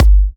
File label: Kick G